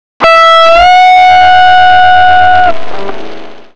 bent note massively distorted but lowpass filtered